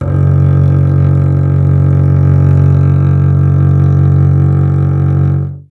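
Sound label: String contrabass; Miroslav Vitous - Solo Instruments VOL-2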